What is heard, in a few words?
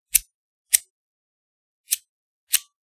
Bic lighter flicking attempting to light